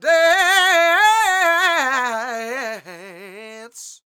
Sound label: DANCE; Spectrasonics Vocal Planet